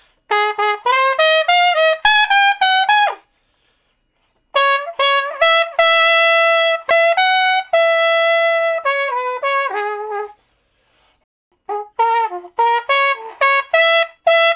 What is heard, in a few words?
Trumpet Slo Blus (Db V, IV, I, V) pickup 1bt; Solos